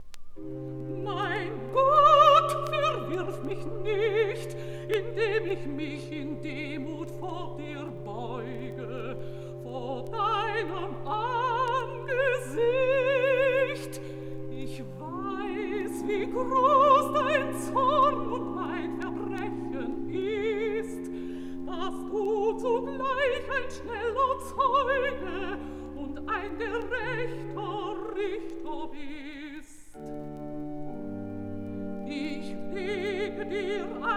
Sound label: Recitativo; Mein Gott, verwirf mich nicht